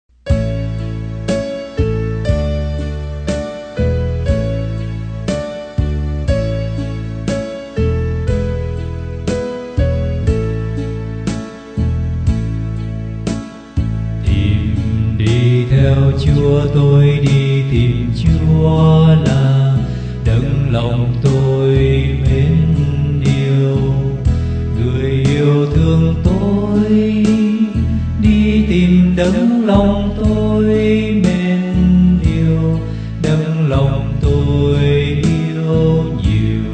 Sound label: BASS